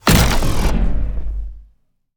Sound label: Sci-Fi Energy Explosion